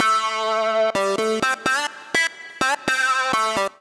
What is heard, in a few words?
Wah Lead melody (Am) 126bpm; Music Loops; Ramsel Wah Lead melody; Ableton; Factory Packs; Singularities